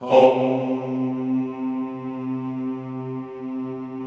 CHO C2, Vohmm Hohmm; Sound & Vision; Gigapack I CD 2

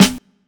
KIT, Dance Mega Drums, HIP; Best Service

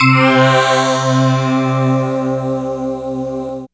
PPG WAVE, OLD SYNTHS; Rubber Chicken Software Sample Bank

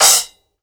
CHOKE, cymbals; Sampleheads - New York City Drumworks VOL-1; SW CYMBALS

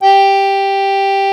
BANDONEON G2; Best Service; Alpine Volksmusik

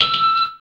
FX Dance

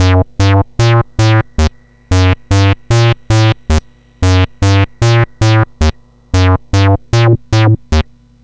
C64 Bass, Commodore 64, Chiptune; 8-Bit

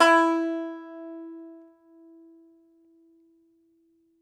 TENR BANJ, Tenor Banjo; E-MU Producer Series Vol. 7 – Old World Instruments

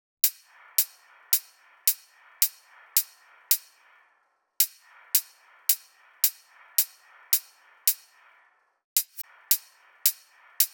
loop hat 116; Seismic Loops

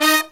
HIGH HIT, Brass Riffs

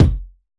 kick, Roland MC-909

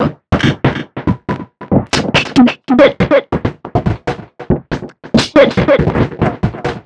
Envelope Beat; Drums & Drones

